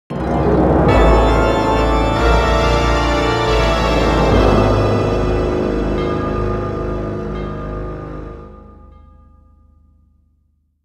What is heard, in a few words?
Defeat